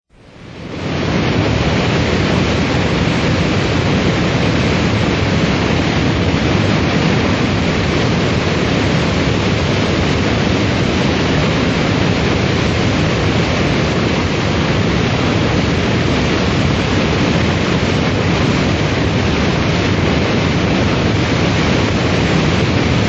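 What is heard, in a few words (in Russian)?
шум водопада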